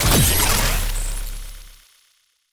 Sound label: gloves acquire, gravity glove machine; mechanical units